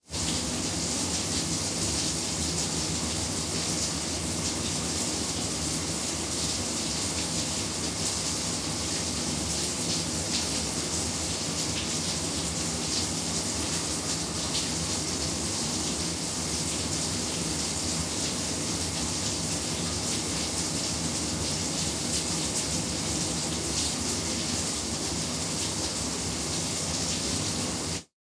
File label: Shower Run Water Door Closed, Household